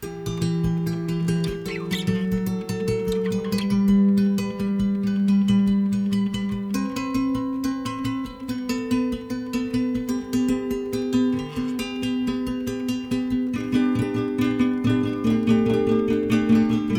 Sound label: instrumental